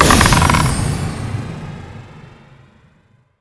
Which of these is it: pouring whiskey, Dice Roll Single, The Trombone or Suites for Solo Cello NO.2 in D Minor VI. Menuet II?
Dice Roll Single